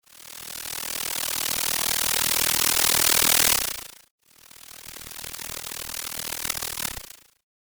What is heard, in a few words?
bugs